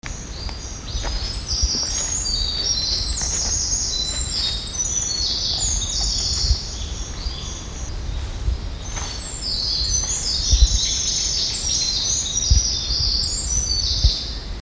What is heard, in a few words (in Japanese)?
misosazai